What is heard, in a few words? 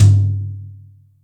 Brsh Rk Lo, Acoustic Tom; Yamaha DTXpress